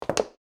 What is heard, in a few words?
Concrete Heels Land; コンクリート ヒール 着地音